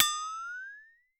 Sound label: FLEX-A-TO, FLEX-A-TONE